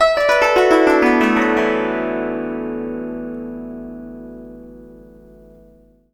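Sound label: guzheng; Spectrasonics; Heart of Asia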